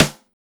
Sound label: BEAT SD